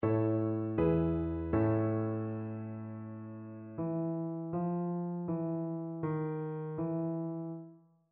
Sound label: melodic fragments